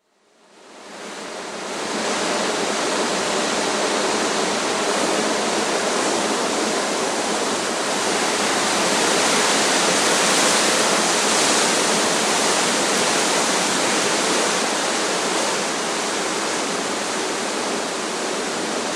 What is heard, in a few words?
Strong wind in trees; Weather; BBC